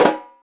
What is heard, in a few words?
Repinique, Percussion; Roland BK-5